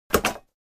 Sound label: chair